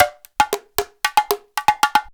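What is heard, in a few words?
emelako grooves; Best Service; World Colours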